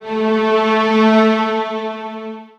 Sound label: violin; String